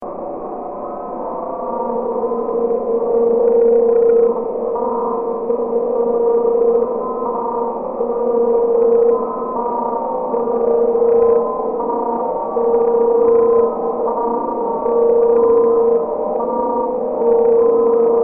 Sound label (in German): zikaden china soundscape; klaus osterwald